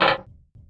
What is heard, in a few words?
footsteps mech